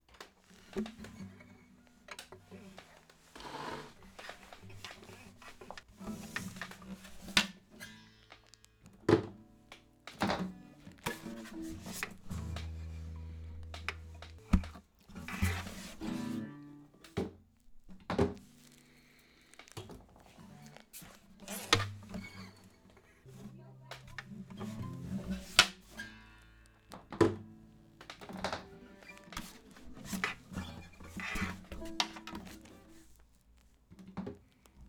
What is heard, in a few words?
Guitar Check 2 (instrumental); zwischendurch